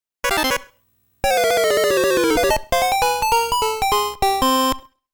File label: Miss ~ Game Over; Game Sound Museum Namcot; Dig Dug; Dig Dug 2